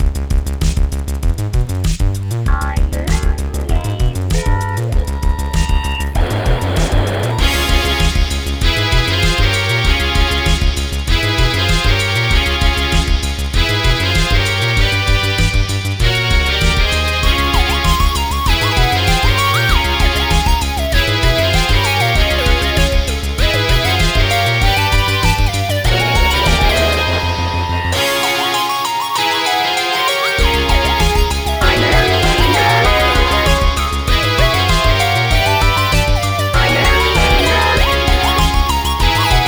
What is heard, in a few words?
hard boss loop; ReisenYoumuOuting